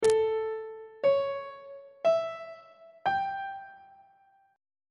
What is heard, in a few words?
f flat melodic minor 7th chord 4 is b double flat dominant 7th; on treble clef